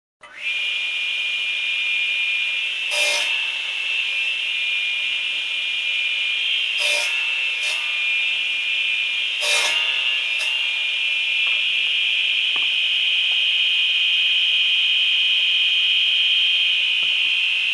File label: Construction